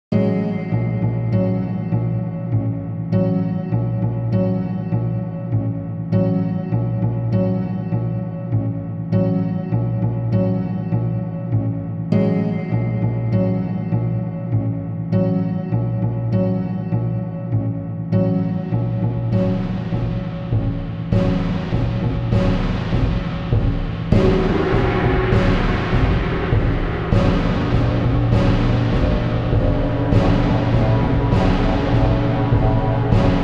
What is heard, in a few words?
Tension Mongolian Drums Voice; Real Warfare 1242